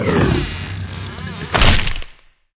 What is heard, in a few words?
poison plant dead, monster